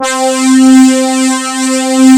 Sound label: XP SYNTHS; Pro-Rec - Synthsonic; JV ANALOG